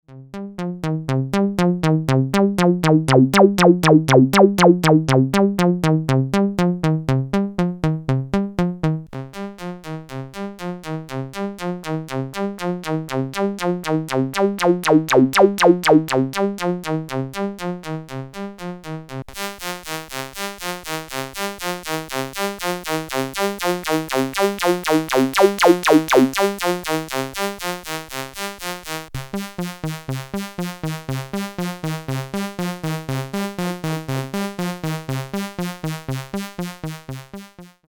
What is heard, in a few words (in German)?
filtertypen